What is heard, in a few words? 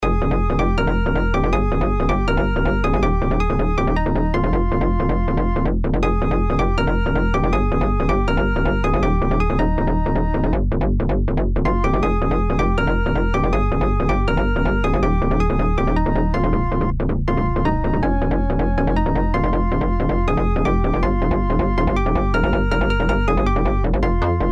bass